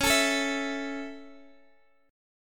Db Minor Sharp 5th Db Guitar DADGAD; strummed

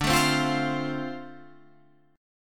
F 6th D, chord

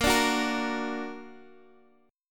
A sharp Augmented, Guitar Standard; A#+ strummed; x,x,8,7,7,6; guitar chord

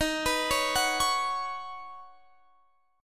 C sharp 7th Suspended 2nd Suspended 4th D sharp

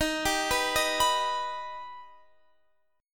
D sharp Augmented, Guitar, Half Step Down

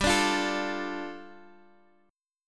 G 7th Suspended 2nd, Guitar Standard; 15,17,15,14,x,x; strummed; capo=3

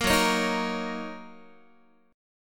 Fsharp 6th down Guitar; F#6; 13,14,16,13,14,x; strummed

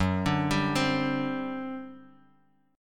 G Major 7th Suspended 4th, F sharp

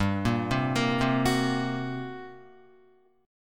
G Minor Major 7th Double Flat 5th, G, Guitar; arpeggiated; JGuitar

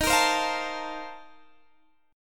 A sharp Major 7th Suspended 2nd Suspended 4th

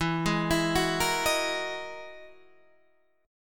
E-Major Sharp 11th E, Guitar Half Step Down; EM#11

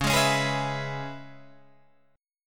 C sharp Minor 7th, Guitar Open D

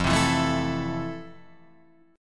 F Major 7th, Guitar, Open F; strummed

